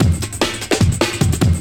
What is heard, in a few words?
dirty disco; Drumloops